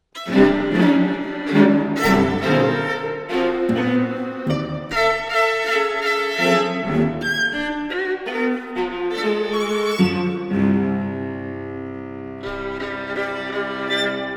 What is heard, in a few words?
microludes for string quartet